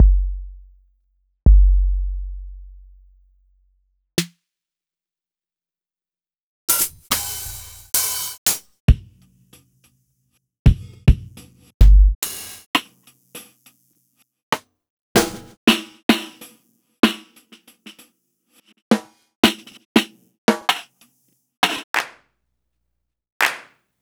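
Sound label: Roland MV8800 drum LAYERED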